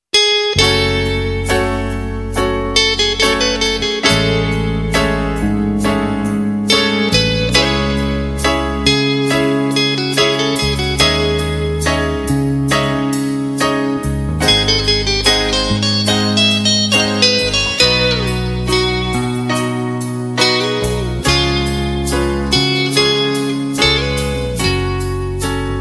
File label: ringtone